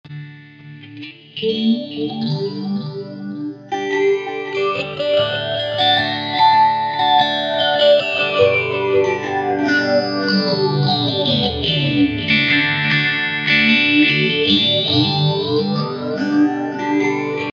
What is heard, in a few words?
GB Phase Guitar Sample